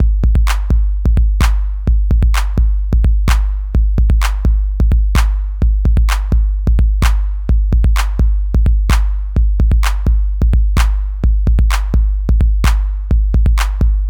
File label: 48k 12k 808 Beat EQ